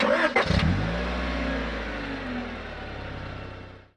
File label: Car Start the engine; d4dj groovy mix; android